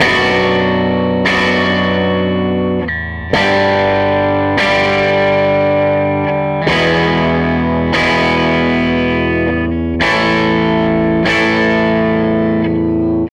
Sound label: SG Guitar Loop